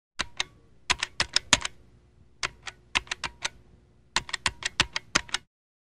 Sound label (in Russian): phone